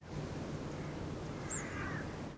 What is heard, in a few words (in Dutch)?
Siberische Boompieper; Nolledijk Vlissingen NL 10nov2012 Thomas Luiten